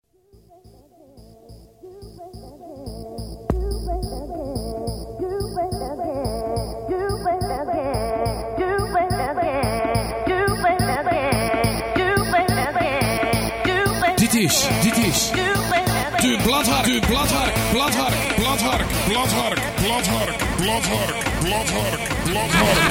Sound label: Jingle